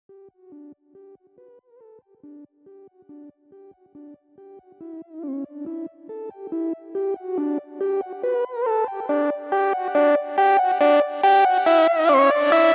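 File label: 140 BPM Intro Melody sidechain; Vengeance - Essential Clubsounds Vol.5; Vengeance Intro Kit; Root E; Synth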